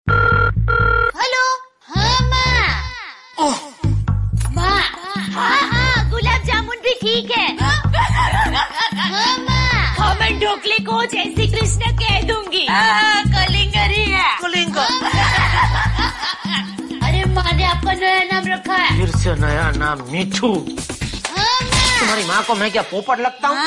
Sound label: Song Ringtone; Taarak Mehta Ka Ooltah Chashmah